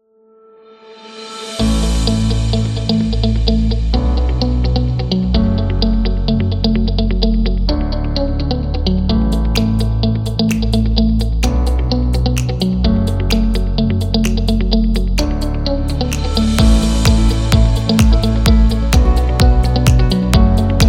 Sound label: The Corporate